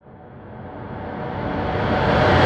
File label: Transition Short Riser; SFX; Risers; Ghosthack